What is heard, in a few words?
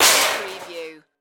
Impact Metal